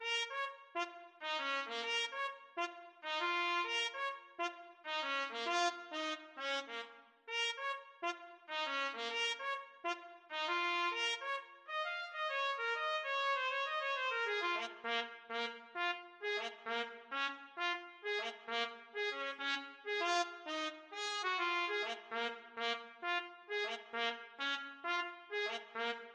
Funk Study trumpet

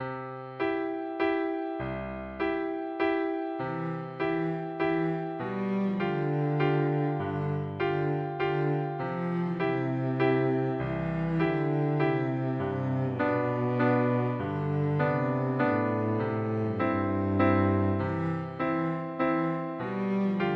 la donna, cello; verdi

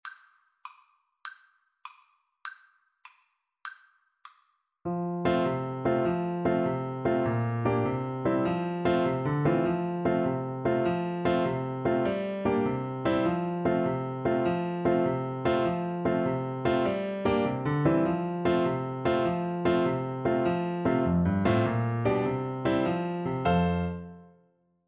itsy bitsy, Tuba; Itsy Bitsy Spider (English Traditional)

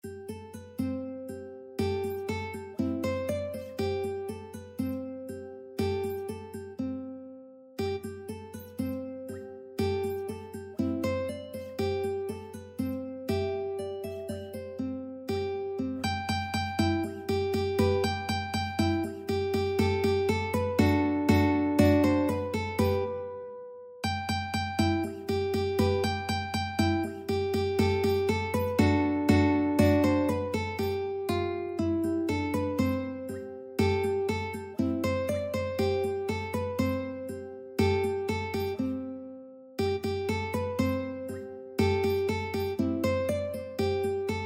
ukulele

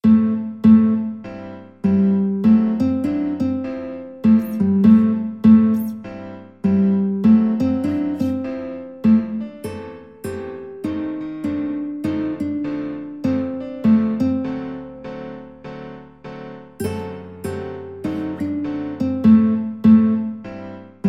guitar

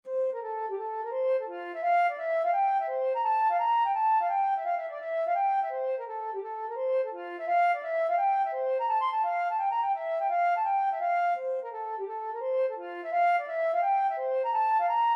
hornpipe, flute

